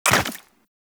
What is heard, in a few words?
Land Goo, Footsteps; Warframe; Tenno